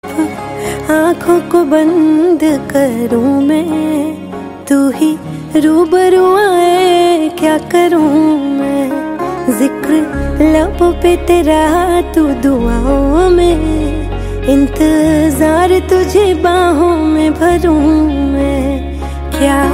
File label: bollywood song tone ringtone